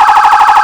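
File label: emergency vehicle phaser, sirens; whelen 295hsfa6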